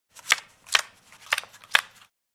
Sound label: Playing cards flipping cards from a deck; poker blackjack